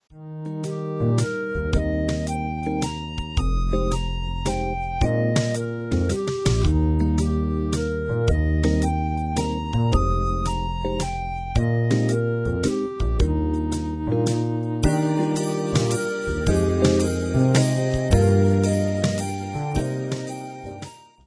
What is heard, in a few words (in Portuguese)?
triade maior